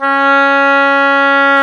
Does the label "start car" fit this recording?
No